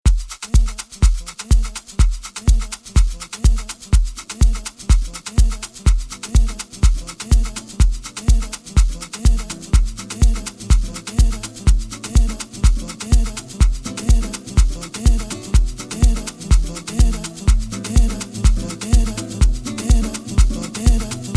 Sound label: Drums